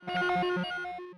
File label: teleport